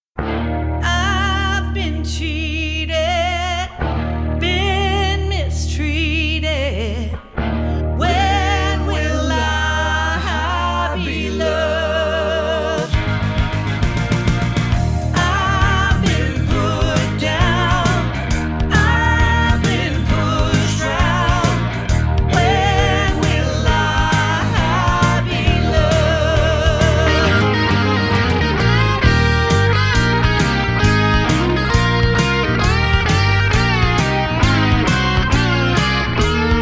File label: Tribute Band